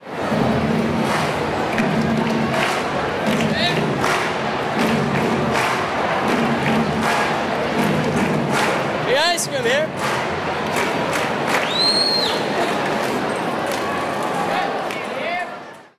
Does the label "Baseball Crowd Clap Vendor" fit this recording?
Yes